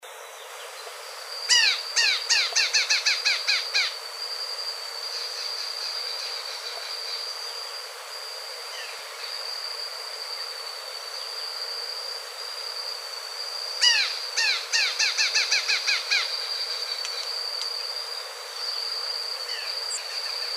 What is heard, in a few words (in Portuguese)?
Canto do abre asa de cabeca cinza